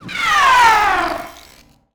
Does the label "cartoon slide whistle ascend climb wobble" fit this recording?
No